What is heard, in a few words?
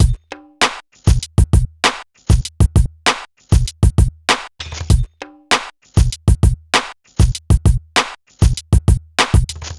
E-Coast HipHop Drums; Digidesign; Pro Tools; Pro Tools Essential Demos; Essential Loop Demos; Demo Session - Hip Hop